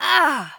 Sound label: grunt, Boys Attack; spiritheroes; Grunts